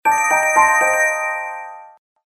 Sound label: Pause tone; Samsung SGH-T369 Linx